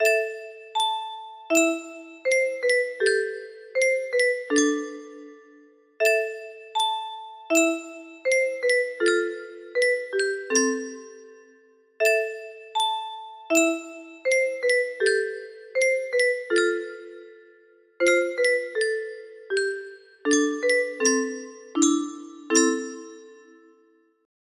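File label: peaceful, music box melody; the elder scrolls iii morrowind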